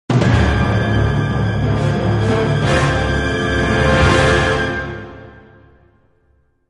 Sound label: battle suspense